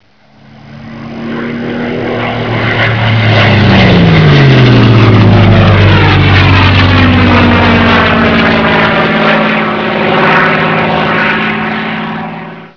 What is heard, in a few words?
flyby